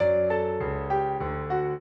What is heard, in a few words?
trio, piano; Mozart